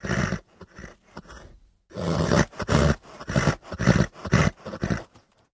Horse Vocal, milling vocals

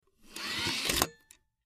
Cd Rom Door Open Tray, computers

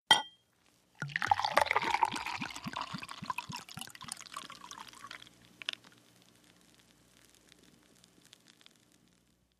Beer Bottle Pour Glas; eating & drinking

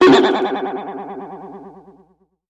Boing Box Vibrato

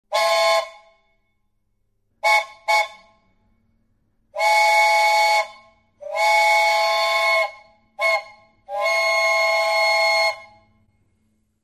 Train Air Whistle; horns

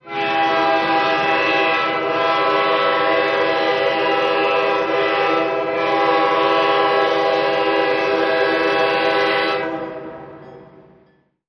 Train Horn Freight

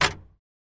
Door Metal Latch

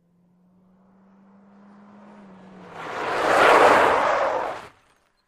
Sm Car 180 Skid, compact

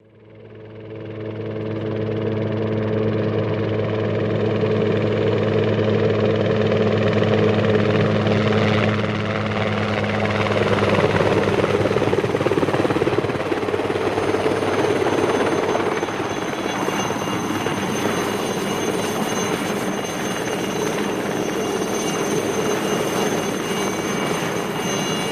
Jet Heli Ext Idl Take O, jet ranger

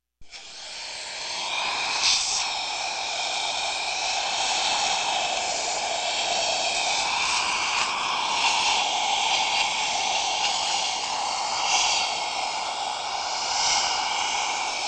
Dental Suction Loop; hospital & lab equip

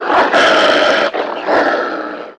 Werewolf Barking

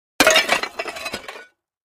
Clay Chimney Tube Hit

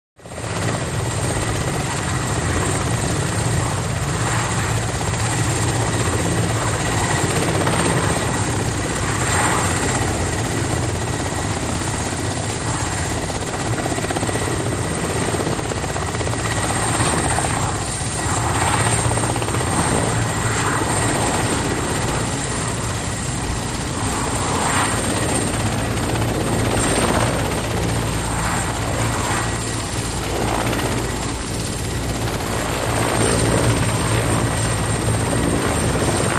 Helicopter Hover

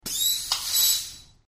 Air Release Valve

